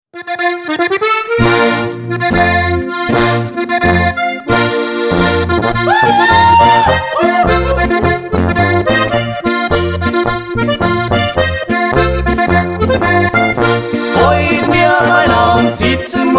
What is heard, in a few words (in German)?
Ischler Landler